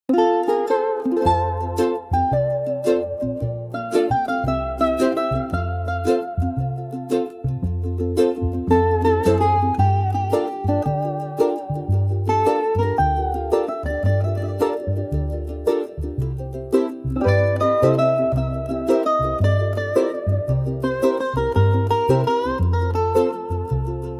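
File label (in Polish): Muzyka Hawajska; Mele Hawaii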